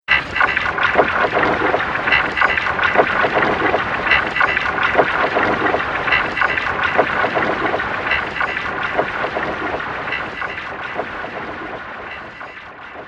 Horror Lab Strange Noises; Scary Soundscape Sound Effects; Andrew Fleming

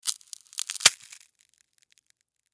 Foam Break Crack Art Craft; Miscellaneous Office; Blastwave; Foley

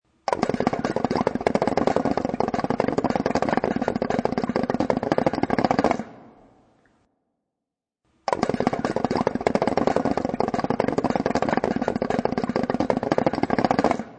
Hookah Exhale, Novelty Ringtone

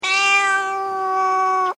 Animal Cat Tabby Meow

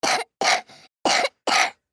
Human Cough; Cough Male Two Yrs Boy; Human Cough Male Two Year Old Boy; Sick; Sound Effects:Human

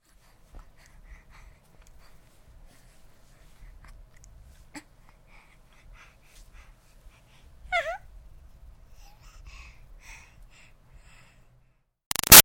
Baby 6 Month Old Breaths Happy; Voice; Babies; Big Room Sound

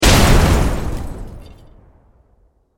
Explosion Automobile Car; Explosions

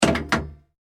telephone receiver down kiosk internal; Telephone Receiver Placed Down ( Kiosk ); Telephones; Electronics; McKinney Sound

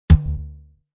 Impact High Tom, Impact hit

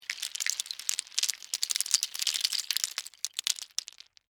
Debris Mixed Nuts, Pile; Texture; Materials; BlastWave

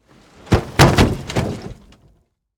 Impact Wood Object, Fall, Over, Heavy; Materials; Blast Wave

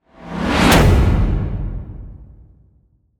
Whoosh To Hit Airy Metal Impact Low; Blastwave

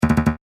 Platform Game Rapid Boing Sound; Video Game Sound Effects; electronic sound effect; Andrew Fleming

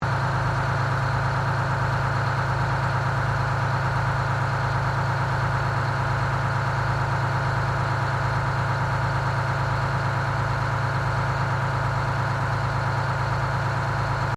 Truck 1969 12V71 Idle Side, Old Kenworth truck; Trucks; Vehicles

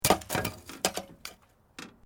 Metal Light Movement Minor; Materials

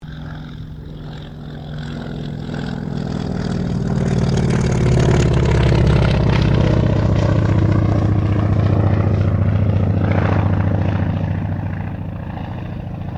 AIRCRAFT CAC WIRRAWAY PASS

